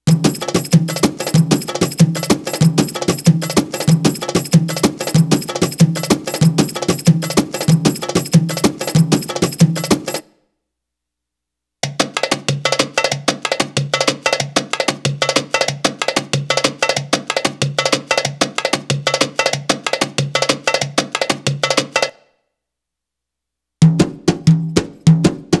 Arabic Rhythms

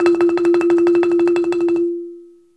BALAFON F; E-MU Producer Series Vol. 7 – Old World Instruments

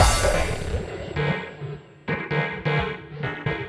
Tech March, Synchro Funk, Beats; Sonic Foundry (Sony Creative Software)

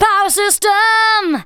VOCALS SUNG; Techno Trance Essentials

